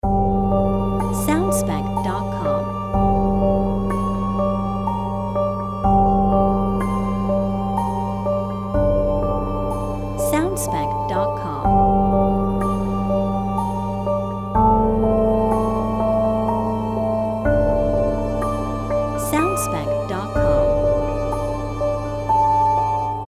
Spooky House Short Loop

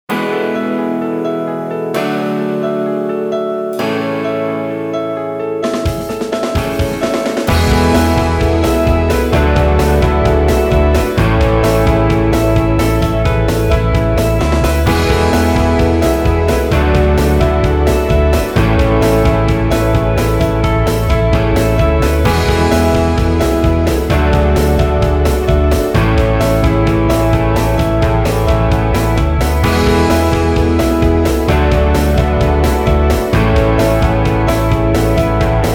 Good Vibe Pop No Guit. Solo

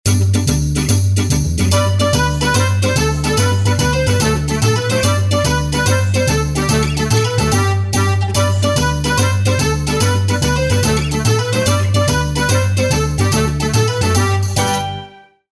Italian Tarantella Dance E Short Version

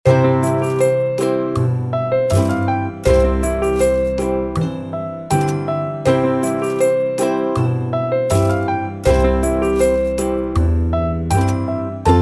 short loop with perc FX

